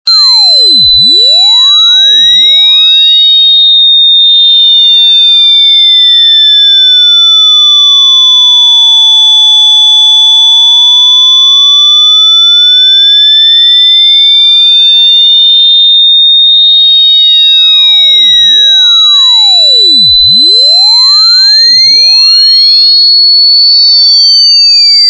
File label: iritating sound